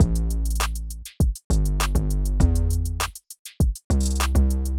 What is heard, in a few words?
drum